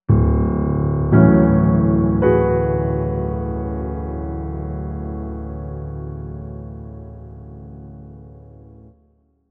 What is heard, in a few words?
Remembrance Jingle; Bayonetta Origins